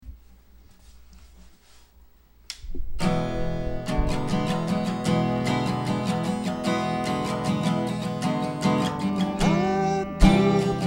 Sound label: paranoia acoustic